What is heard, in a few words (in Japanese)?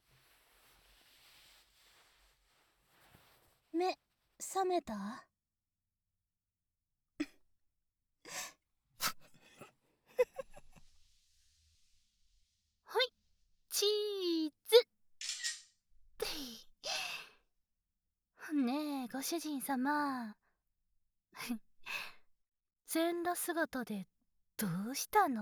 耳舐め射精我慢; シコ無し; 「まずは耳舐めアクメ我慢だっけ？」